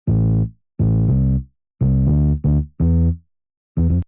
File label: Bass Tube